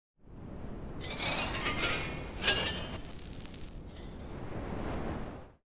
crunch zones 3D

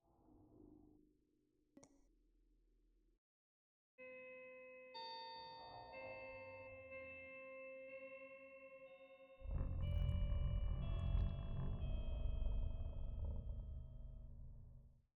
Guitar Piece

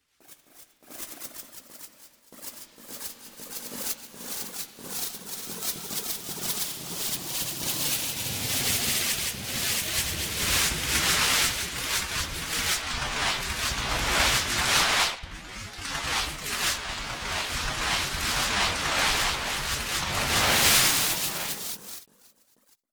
In Flight Sparse to Thick

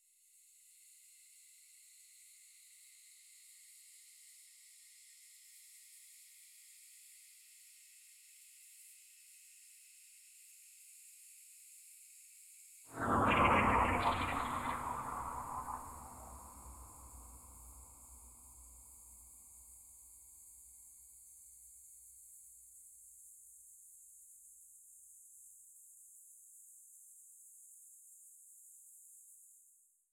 crunch strata

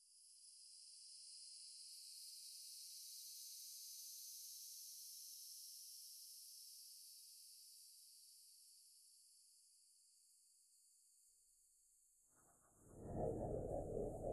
crunch strata

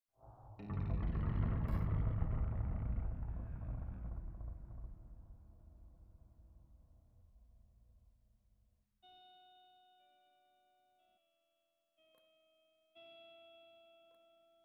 Guitar Piece

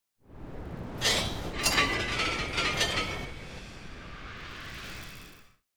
crunch zones 3D

